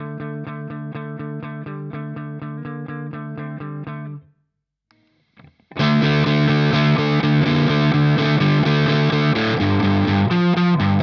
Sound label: Germanium Fuzz Humbucker Gain 2; Benson